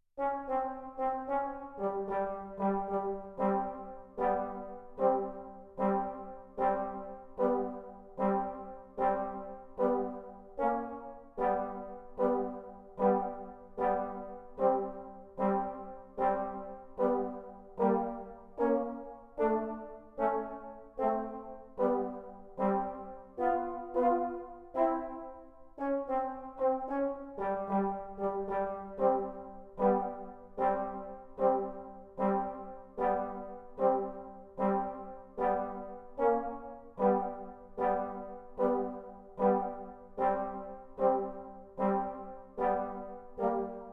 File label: Underwater; Super Mario Bros.